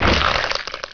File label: gib; Hexen2; player